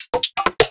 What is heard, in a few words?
perc fill